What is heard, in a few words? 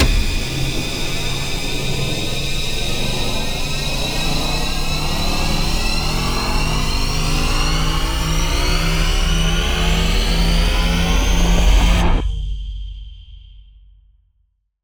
Booster Sounds FX; Video Game; Galaxy Rush; Cymatics; Video Game Sample Pack Vol 1